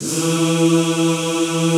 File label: ZZZ E2, Classical Choir; Best Service; Extended Classical Choir